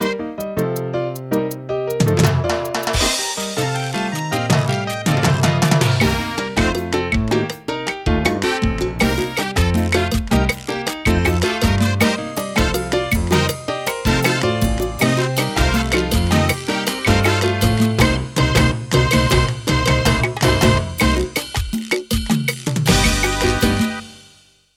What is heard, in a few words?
salsa